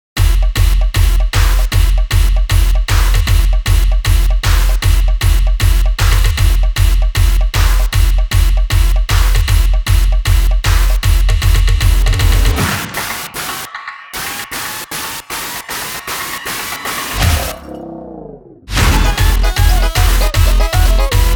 Battle (Robot Circus); YIIK A Post-Modern RPG

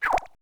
Pop it back; LittleBigPlanet 2; PS3

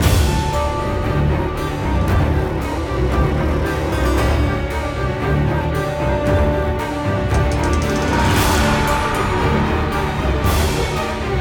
String; assassin s creed unity dead kings

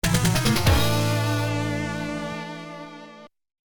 Game Over (Internal Sound + Mercury Unit Version); Asuka 120% Burning Fest.; X68000

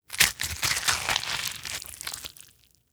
human flesh rip drip blood; A Dump in the Dark

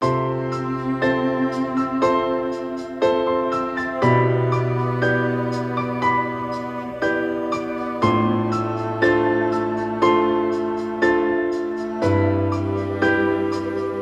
Slight Sad

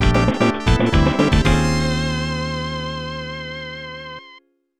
Mission Complete; SD Gundam Sangokushi Rainbow Tairiku Senki

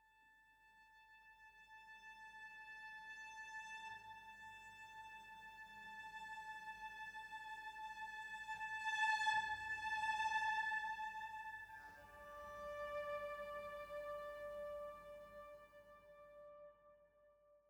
Explore Day Stringlines; horizon zero dawn

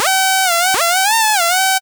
Beat

